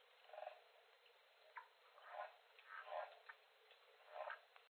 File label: southern leopard